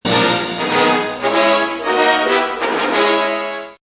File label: Imperial attack music; Star Wars; Star Wars Misc